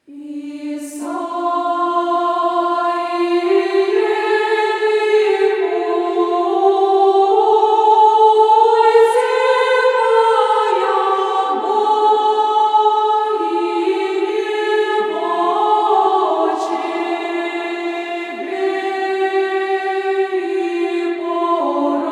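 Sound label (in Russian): Staroobryadcheskiĭ zhenskiĭ khor, Old Believers women's choir; Amfor; Isaie likuĭ. Irmos voskresnyĭ 5 glasa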